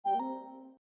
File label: message; Dargalon